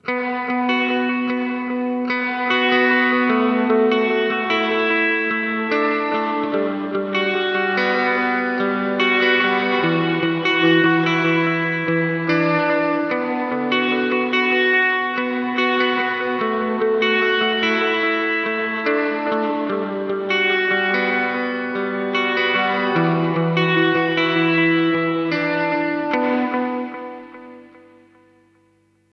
Boss RE-202 Plate Reverb

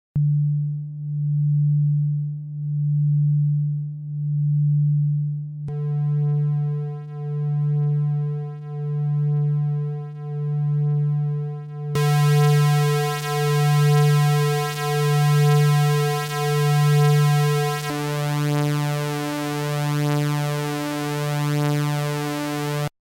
OSC Waveforms

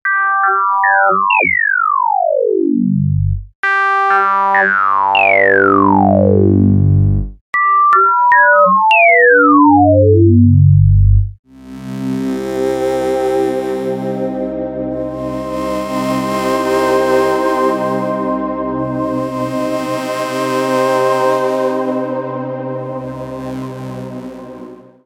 resonant

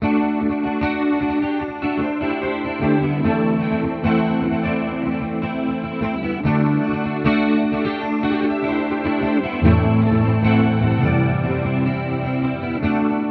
Mooer GE300 Lite Clean Modulated Reverb

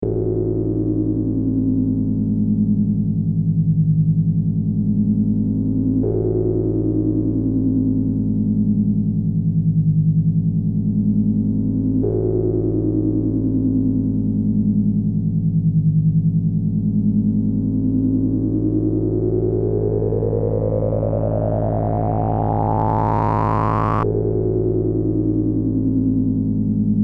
Drone; Doepfer Dark Energy III

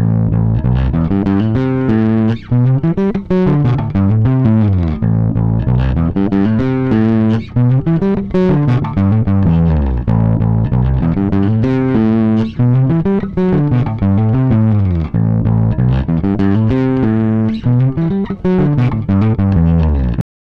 full gain boost

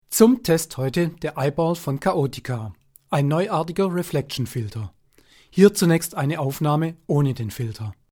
Vox; Kaotica

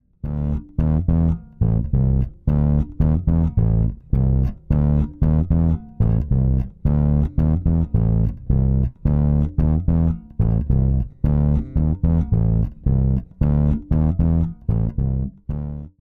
MTD DB599 JPTR Digitech; Gnome

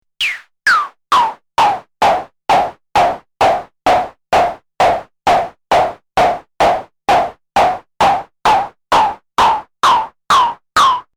Snare SE80

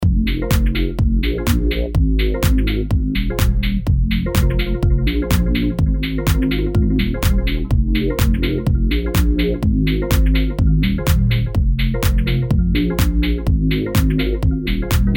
Delay Presets

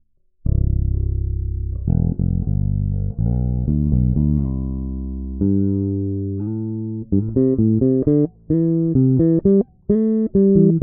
Both Pickups Tone closed

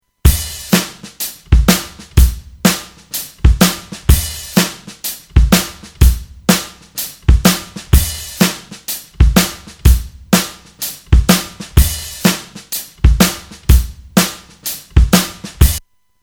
Acoustic Drums EQ THD Med

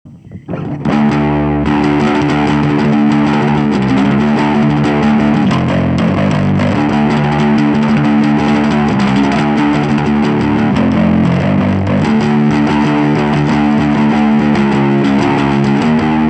Blackstar FLY 3 Bass Overdrive

